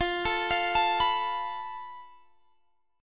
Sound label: F Major Flat 5th Guitar Standard; FMb5; arpeggiated; x,20,19,22,22,19; down; capo=3